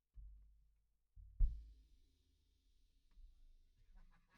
Kick In

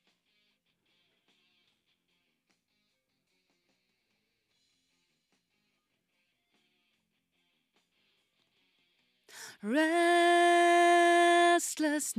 Vocal

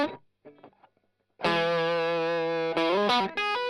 Strum Line

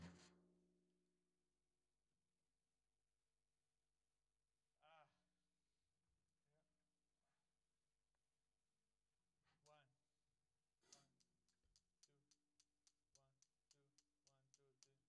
Floor

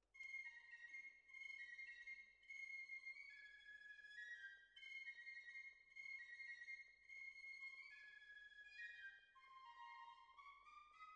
Cellos

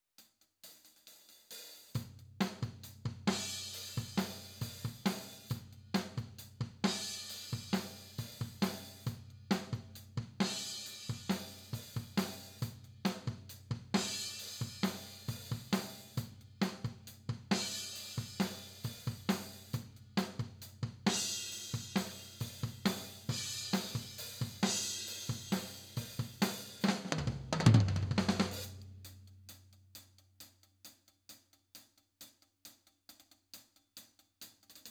Mid Tom

